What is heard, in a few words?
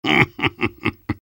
soldier laugh short; vo